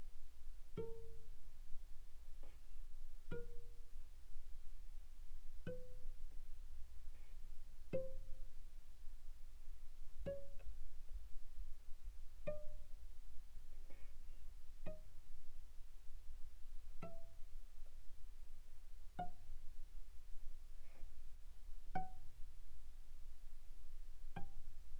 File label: Cello pizz pp sul A A4 A5; mono; Strings